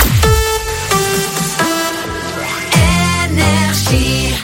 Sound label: Jingle; RAPID FIRE ID; NRJ; RAPID 2023